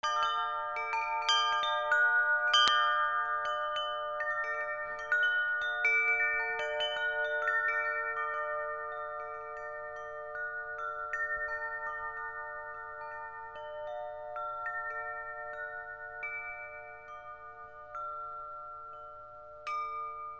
BELLS

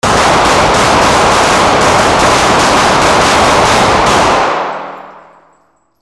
WEAPONS

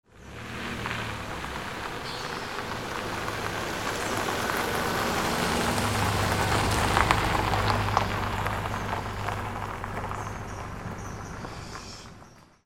CARS

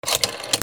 PHONES

